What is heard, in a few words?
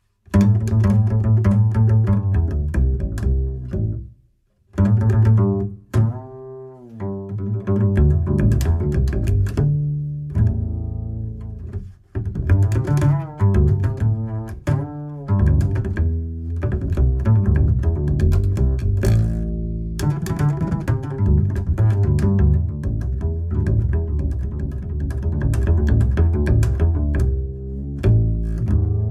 bass below